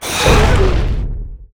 Toxy Chain Scorpion death; Karutan

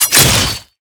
Fierce Attack; MUDevs S20.1-3

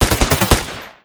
Iceblast; GunCrasher

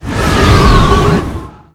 Darkside; Ragefighter

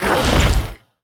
Devil Scythe death; Karda Mahal Underground Temple

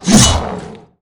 salamander attack; Akeron

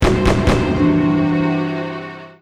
card fail; MuRummy; MUDevs S20.1-3

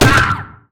hit; undine; Akeron

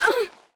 Bass hit; MUDevs S20.1-3

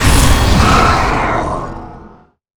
King Appear

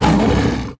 Magma Hook hit; Ignis Volcano

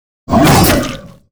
Dead miner attack; Kubera Mine

